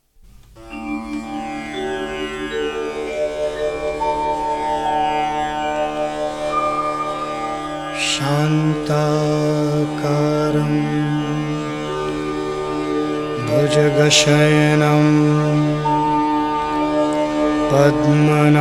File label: vishnu stuti mantra shantakaram bhujagashayanam